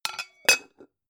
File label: pan close chink